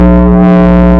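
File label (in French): son du déploiement du cham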